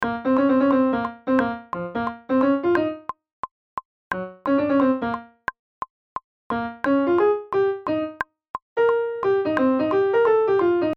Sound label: sax